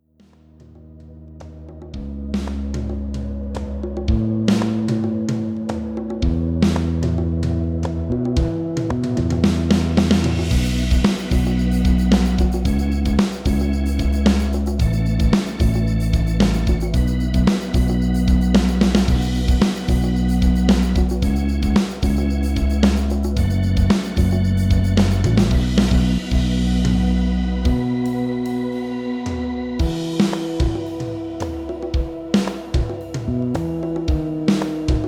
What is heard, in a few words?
rhythm